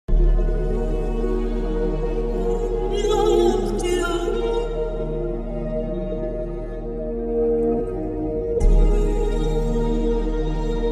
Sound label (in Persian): بیت رپ احساسی